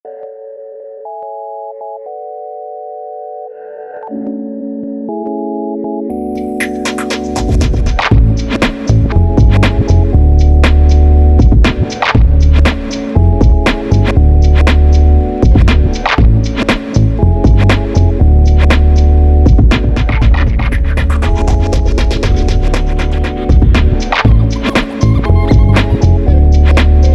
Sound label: Reverb; UFX; ujam